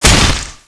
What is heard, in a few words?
ghoul hit, claw; cstrike